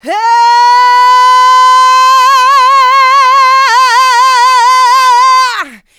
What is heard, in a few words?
vocal house adlibs; PS-28 Vocal House Samples